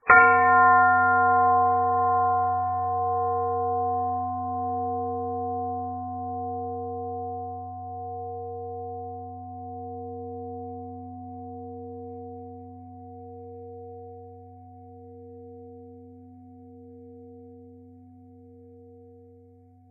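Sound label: Buddha large bell; jidaigeki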